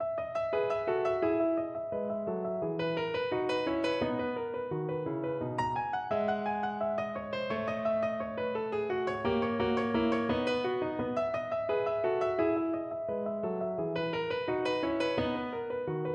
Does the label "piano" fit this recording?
Yes